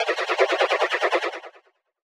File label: StarShip Sounds